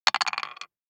DICE on Wooden Tray, Throw and Roll, Standard, 2 Two Dice; Samples; ArticulatedSounds; DICE Sfx Party (Extended)